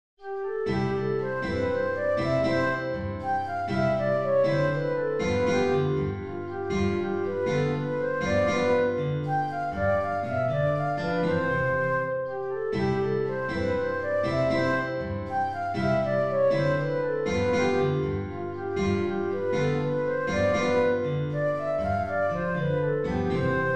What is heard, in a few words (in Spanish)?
cancion; lewis alastair; chilli